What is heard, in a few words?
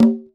Single Hit, Conga; percussion; musicradar